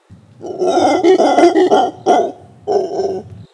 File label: howl, alouatta palliata; bosque seco